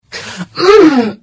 Hit; The Elder Scrolls III - Morrowind

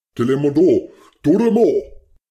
combat yells; Kuru; SyrinScape; Iris hill manor

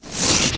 blunt out; The Elder Scrolls III - Morrowind; Morrowind GOTY GOG

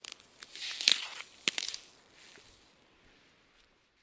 Ice crackles

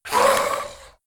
Ghoul Growl; SyrinScape; East Ward

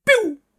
laser guns; SyrinScape; Syrinscape unplugged; Jungleplanet dinolaser battle

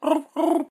bulb bulb frog; SyrinScape; Syrinscape unplugged; Jungleplanet dinolaser battle

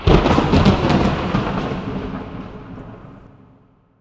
Conjuration Hit; The Elder Scrolls III - Morrowind; Morrowind GOTY GOG

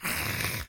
Goblin Hiss; SyrinScape

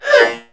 roar; The Elder Scrolls III - Morrowind

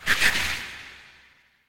Dragon claw scratch; SyrinScape; Madness in the library

